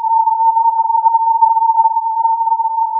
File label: filtered input